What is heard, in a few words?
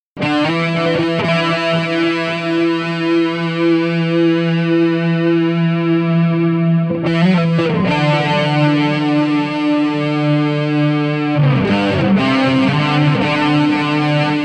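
Intro Boogie w 88 EQ settings, guitar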